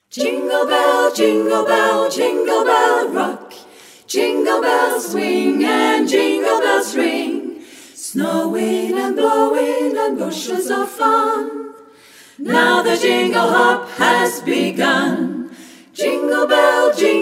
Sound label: Jingle Bell